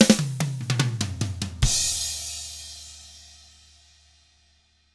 drums, 50s rock; ILIO; Double Platinum Drums 1

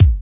KIK FULL BD, Kicks; AMG; Now CD-ROM (Roland); NOW! Drums

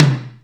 DRM F#, YAMAHA R5+RX; Best Service ProSamples vol.55 - Retro Sampler; AKAI

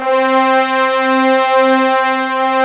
orch brass C4; AKAI S-Series CD-ROM Sound Library VOL-2

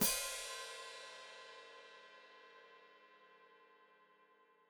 Hat Closed Open, Hi-Hat

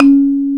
BALAFON C#3

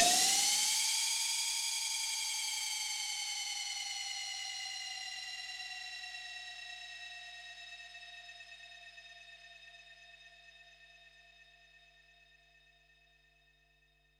HH, Pearl SC-40 Hihats and cymbals

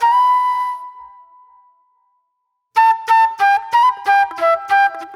Sharp Delay Flute

